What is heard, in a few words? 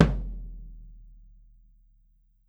SURDO F, Brazil Drum Perc; E-MU Producer Series Vol. 7 – Old World Instruments